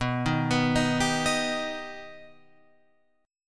B Augmented B Guitar Open F, chord; arpeggiated; JGuitar

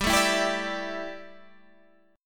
D Suspended 2nd Suspended 4th G

